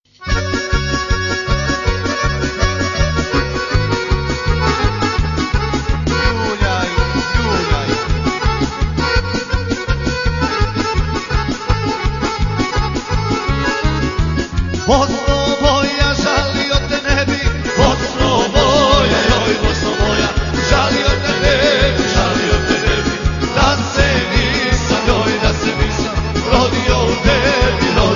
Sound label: Soft Bosnian